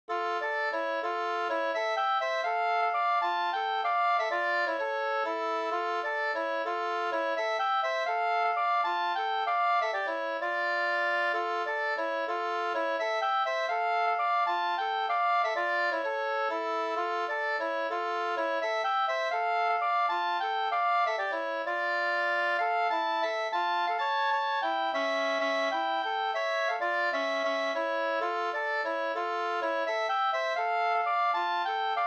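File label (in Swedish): polska, tvåstämmig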